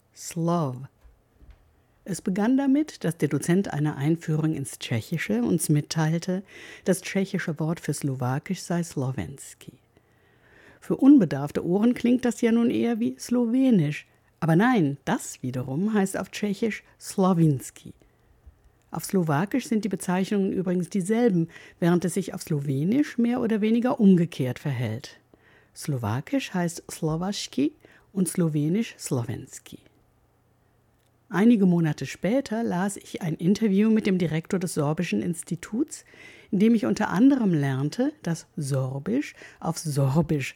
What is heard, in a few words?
Slow